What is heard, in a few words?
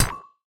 Shield Shot; Game rip; MiSide